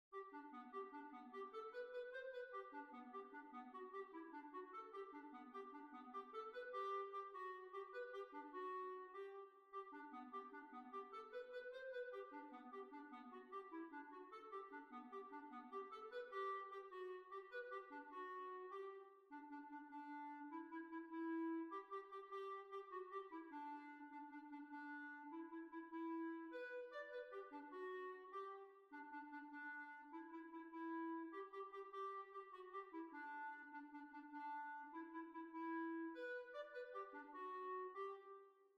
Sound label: Jig; Oscar Woods Jig; B flat